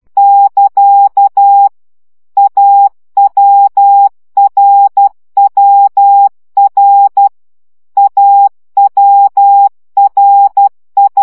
CW gyakorlas a r w 10 baud

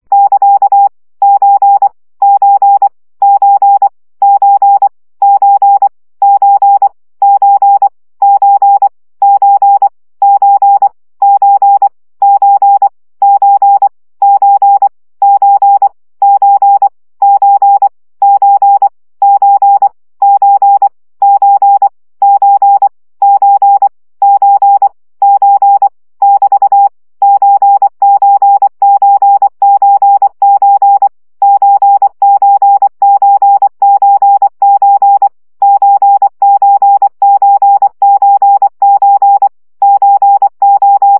CW gyakorlas o2 20 baud

